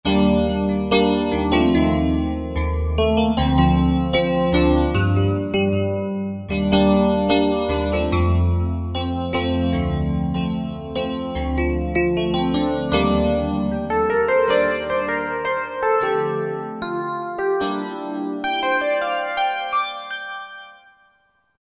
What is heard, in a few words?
Rhodes, Roland SR-JV80-03 Piano